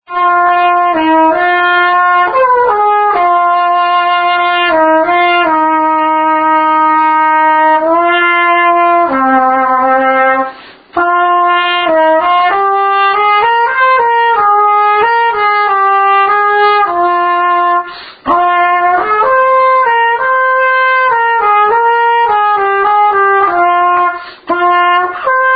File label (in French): trompette